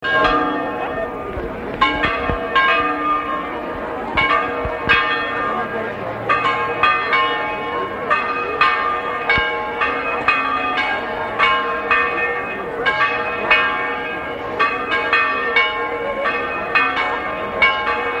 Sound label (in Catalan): campanes